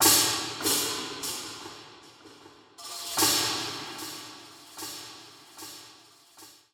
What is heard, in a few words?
Forest Perc